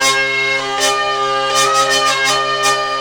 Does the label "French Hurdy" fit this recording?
Yes